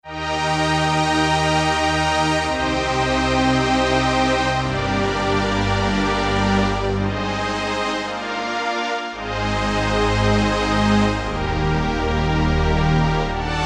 Omni II, Roland JD-990; Vintage Board

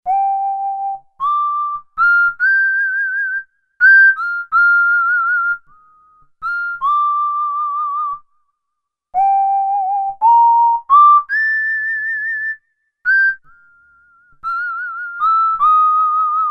WHISTLE, Korg DW-8000; Factory Patches

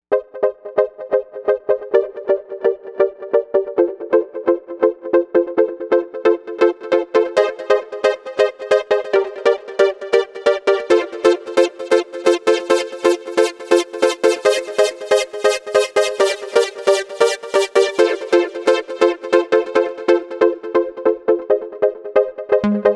Trance Saw Pluck; Novation UltraNova; Factory Patches

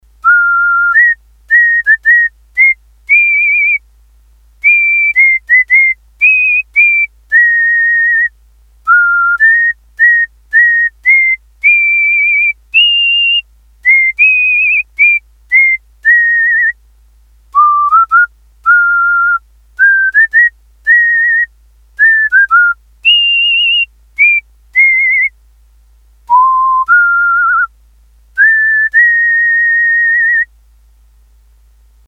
Whistle, Korg DS-8; Factory Patches

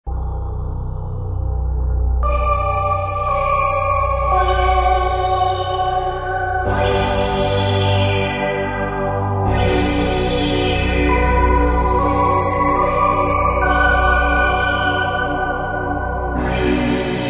Triton, Yamaha TG55; Factory Presets

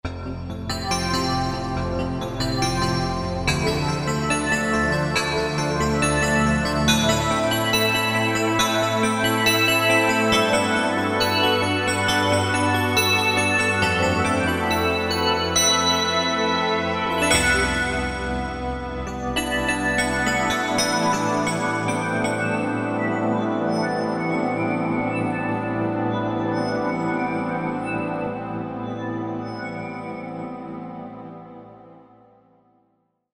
Spaces, Yamaha TG77; Factory Presets

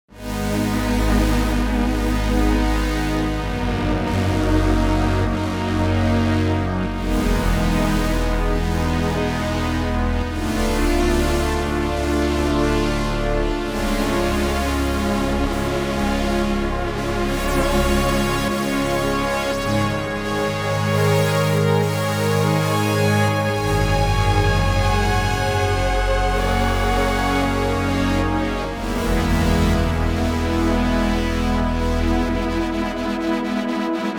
Big n Beefy, Roland JV-880; Factory Patches

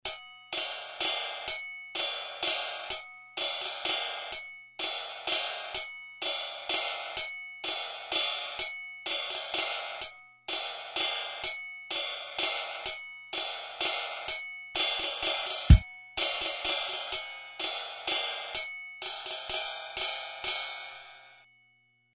Waltz, Casio CSM-1 Rhythm Patterns

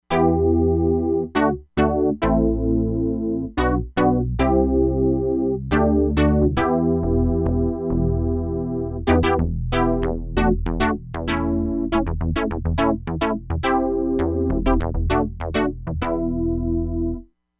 PULSE DECAY; Crumar Bit 99; Factory Programs

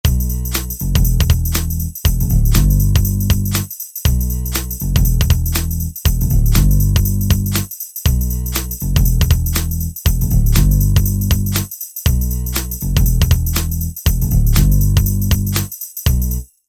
TripHop

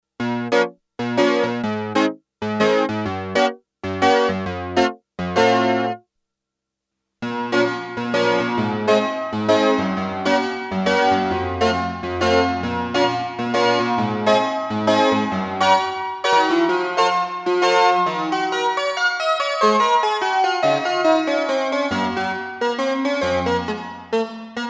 REV PLATE

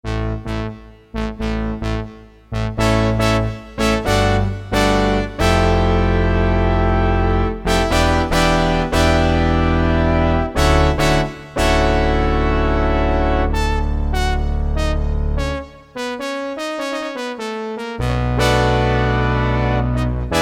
TIN BRASS, Korg DSS-1; Metal-Metalism

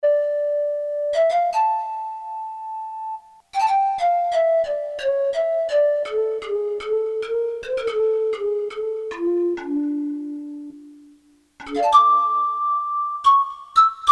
PANFLUTE, Korg DSS-1

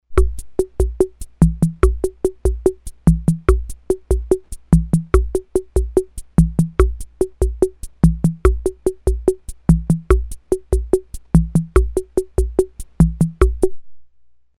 MAMBO, Ace Tone Rhythm Ace FR-2L

